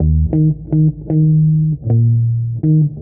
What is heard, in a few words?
bass, Phonky Loops